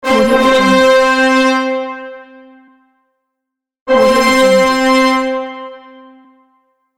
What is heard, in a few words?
congratulations trumpet